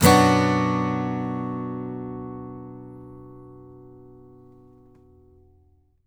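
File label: Acoustic guitar B minor down, Country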